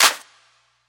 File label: clap c key, Royality free clap sample tuned to the C note. Loudest frequency: 4379Hz; percussion; single hits one shots